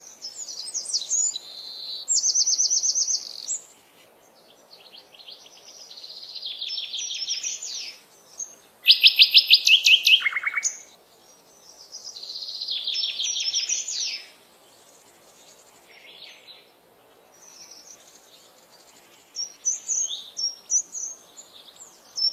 birds woods; svencoop; lostmaze

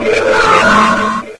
attack growl, bullchicken; mistake coop

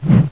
claw miss, zombie; mistake coop

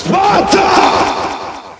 rifle, ambience; svencoop; cracklife

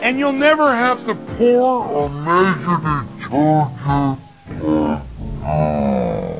die, bullchicken; svencoop; cracklife

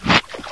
bc attack, bullchicken; mistake coop

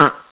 suit charge no; svencoop; cracklife

